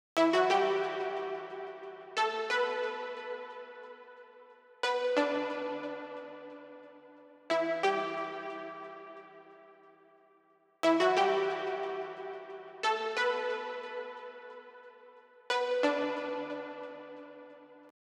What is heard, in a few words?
Processed 90bpm Plucks